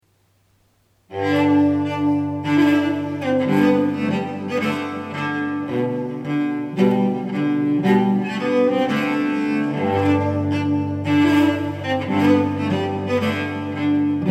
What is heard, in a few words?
trumpet tune, solo cello